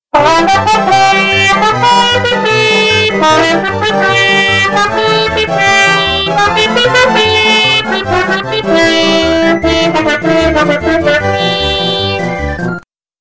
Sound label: trumpet dog